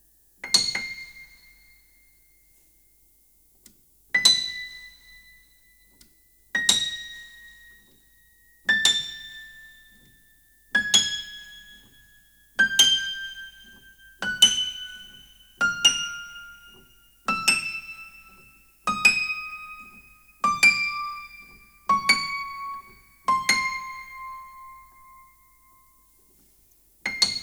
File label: 8000Hz-20,000Hz; TIS Audio Space Reference 2 Ls-35a; Audio Space Unplug